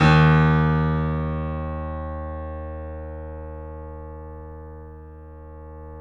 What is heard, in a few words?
D#, The Piano; InVision Interactive - The Piano